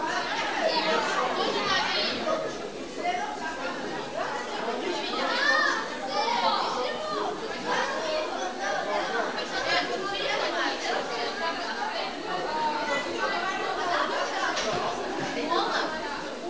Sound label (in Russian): peremena; de 118shkola